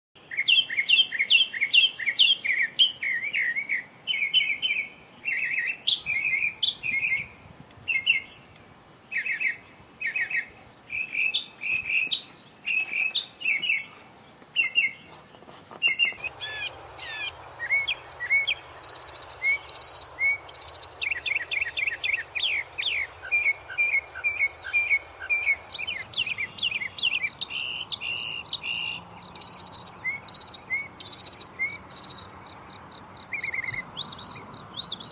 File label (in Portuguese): Canto do imitador poliglota